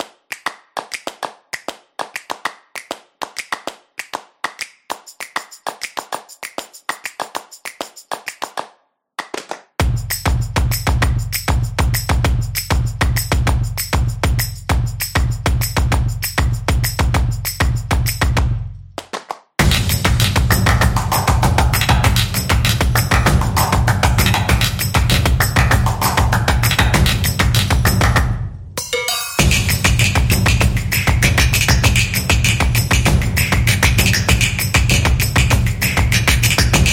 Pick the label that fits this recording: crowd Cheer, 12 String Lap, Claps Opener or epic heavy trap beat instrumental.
Claps Opener